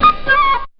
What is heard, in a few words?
FLUTE SHT; BEAT2000